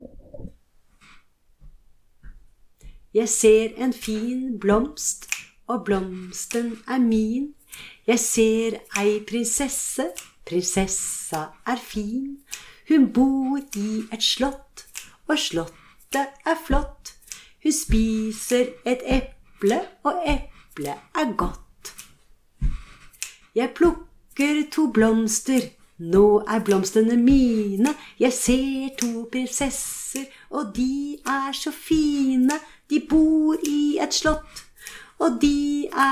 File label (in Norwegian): sarassang; mottaksboka; sanger til mottaksboka